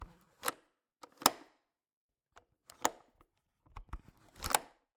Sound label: Cabin, window, latch, flip, lock, open close, wide; Eiravaein Works; Vaeyan IV